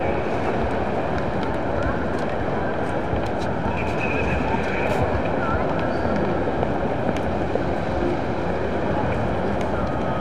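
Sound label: atmosphere hall footsteps; best service Blue Box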